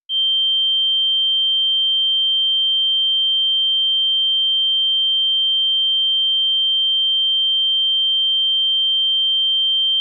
3.15kHz

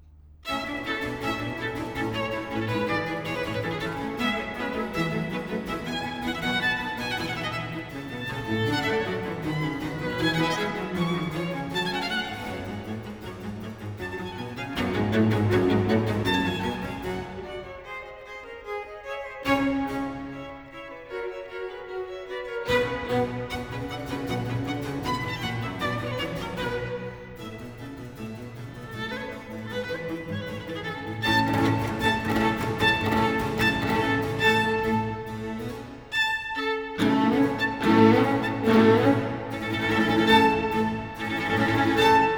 Concerto In B Flat For Strings, Allegro Molto; Vivaldi; RV 163, "Conca"

